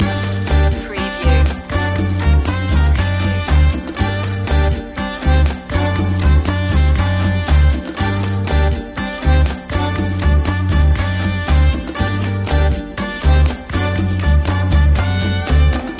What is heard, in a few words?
cuban heat